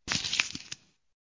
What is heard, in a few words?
turn a page; human-activity